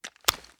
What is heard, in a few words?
first raise end; reloads; gmod